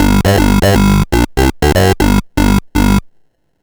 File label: Sh09 Bass Loop, 120BPM